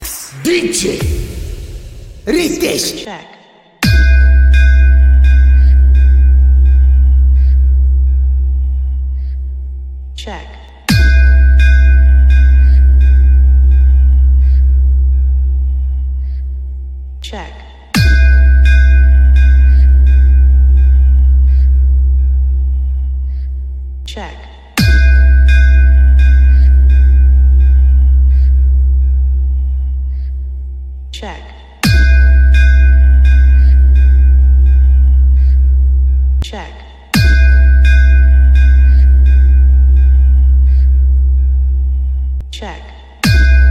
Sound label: Speaker Check Long Punch Vibration Mix